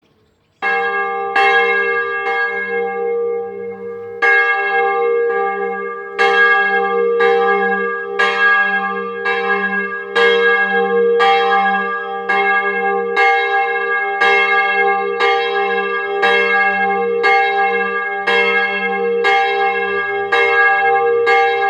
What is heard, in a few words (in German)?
Glocke, Reformierte Pfarrkirche; Glocke 3; St. Blasius Ziefen; die glocken